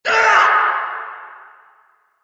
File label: death; hl2dm